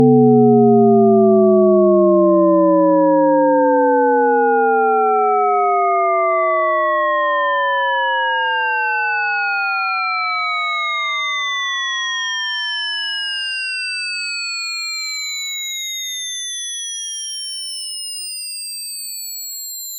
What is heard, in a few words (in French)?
son descendant en montant; sons paradoxaux